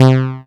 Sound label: SYN, Microwave; Sound & Vision - Gigapack I CD 2